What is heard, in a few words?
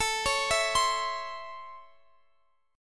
Db Minor Major 7th Sharp 5th A